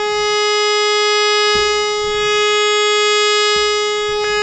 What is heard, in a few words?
HARMONIUM, World Instruments